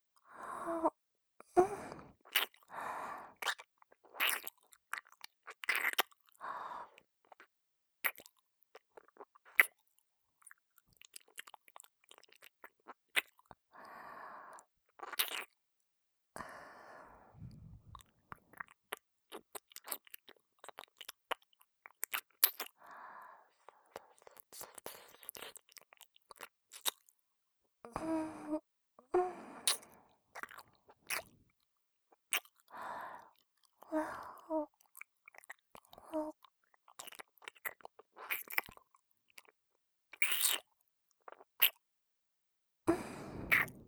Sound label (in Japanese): 耳舐め ループ トラック 右